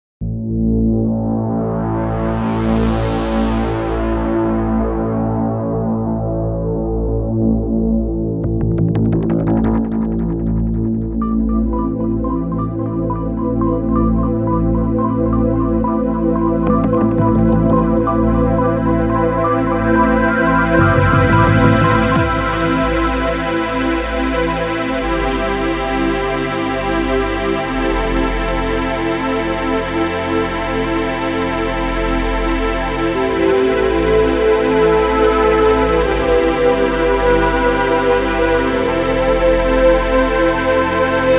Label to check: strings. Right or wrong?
Right